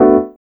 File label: CHORD, 160 BPM, Drum & Bass